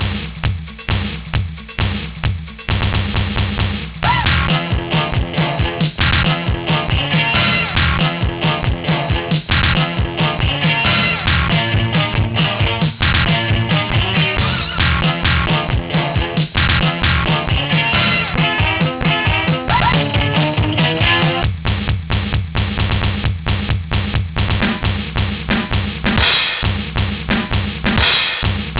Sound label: space racer, pc sound